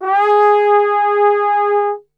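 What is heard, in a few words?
G#3 trombone glissando, brass; Best Service; Brass Super Section; TRMB GLISS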